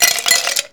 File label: get drinks; SpongeBob Diner Dash